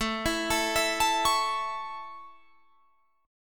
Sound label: A Major, Guitar, Drop D